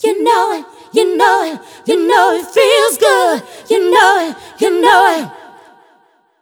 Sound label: VOX, Funky Vocals; USB Soundscan vol.18; AKAI